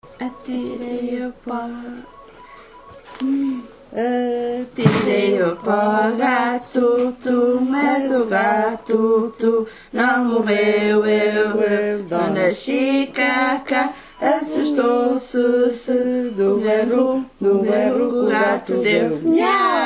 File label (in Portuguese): Atirei o pau gato, chanson portugaise